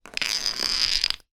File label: medicine vitamin tablet tube roll on table